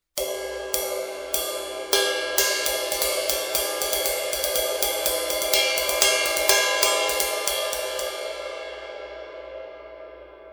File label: sabian 20 aax studio ride